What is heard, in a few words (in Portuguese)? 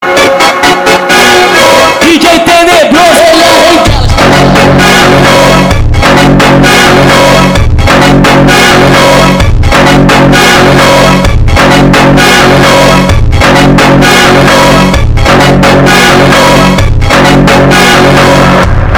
montagem orquestra